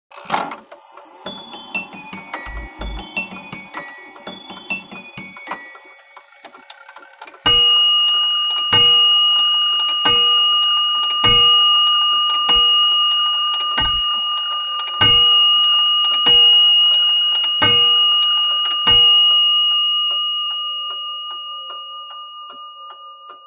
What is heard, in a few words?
Bracket Clock Chimes; clock chimes rings